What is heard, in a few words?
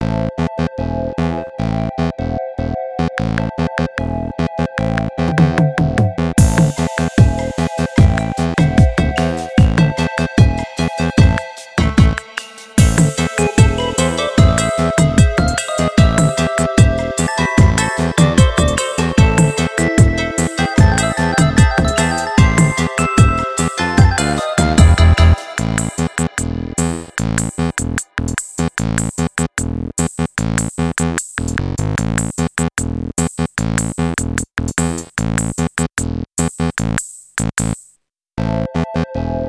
Lobby Bossa; Return All Robots